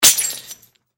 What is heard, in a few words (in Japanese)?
お皿が割れる音